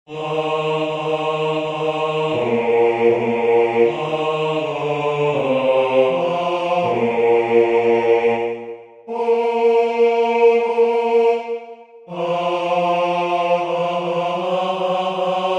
Bass; Funeral music of queen Marie

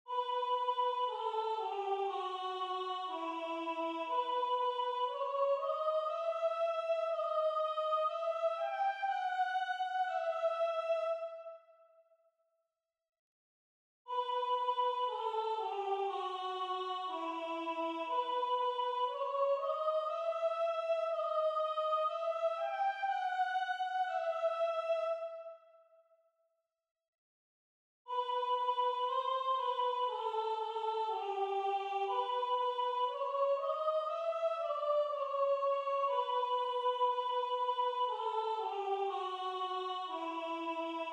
Soprano; Dietrich Buxtehude; Weicht, ihr Trauergeister

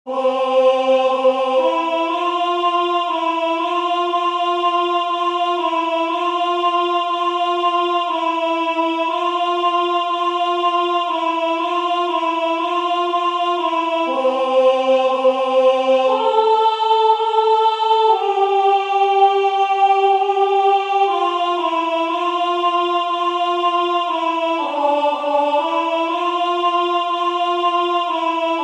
Alto; Alta Trinita Beata

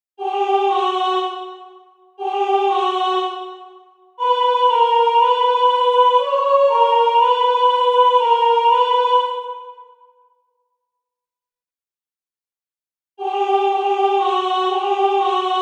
Alto; Bach; Cantate 4

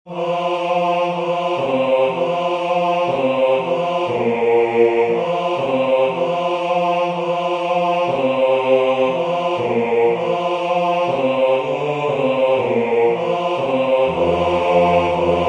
Bass; Alta Trinita Beata

